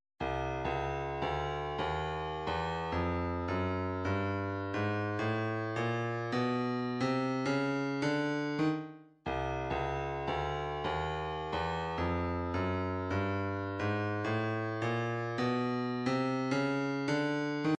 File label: separated elements snare